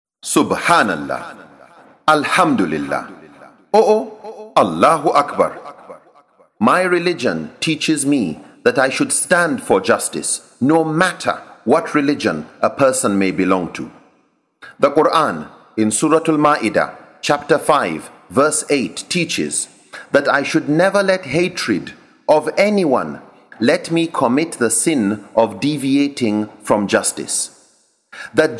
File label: MUSLIM JINGLES